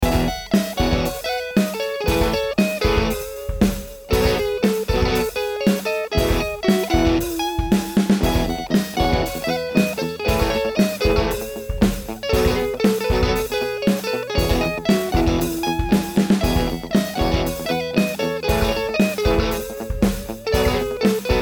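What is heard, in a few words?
Vox AC30 Top Boost 2x12 Combo U47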